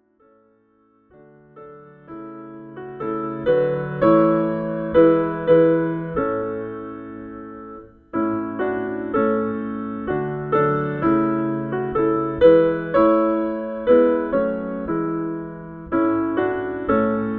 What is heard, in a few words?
PIANO; gospel